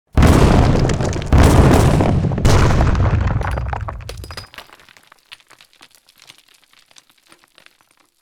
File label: collapsing stones